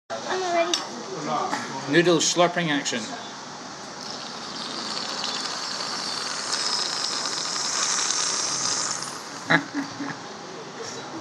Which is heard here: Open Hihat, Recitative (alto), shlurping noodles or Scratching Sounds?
shlurping noodles